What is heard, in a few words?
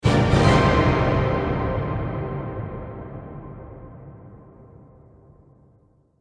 Orchestra Horror Sting; Stinger